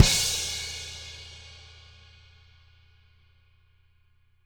CW KIK -R, Living Drums, SD CYMBALS; Sampleheads; Peter Erskine

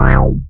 RESO BASS, SYNTH FUNK; Zero-G; Funky Elements

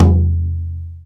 BOBA, ETHNIC PERC; InVision Interactive; Lightware VOL-1

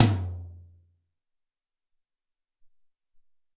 Big Lo Tom, Alesis SR-18

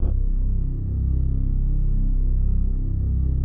PAD, DIGI-PAD

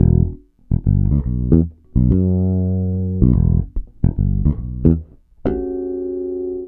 Ultra Freakin' Bass, 72 BPM; Sampleheads

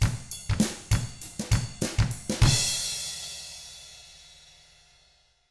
FUNKY, Drums

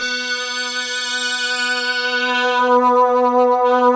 PPG sweep, PPG WAVE 2; Greytsounds VOL-1

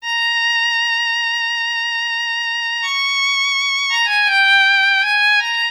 Violin, Trip-Hop Loops; Sonic Foundry (Sony Creative Software); Rondo Brothers Trip-Hop Loops & Samples; Hard Rocks The Roof

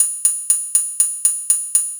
NRG Cymbal

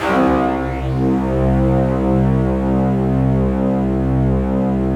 SQUEAK C1, Yamaha VP-1; SQUEAKSTRNG2; Music Store

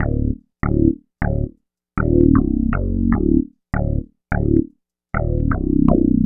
Bass, Synth Bass Loops; Basses; Sonic Foundry (Sony Creative Software); Groove Elements

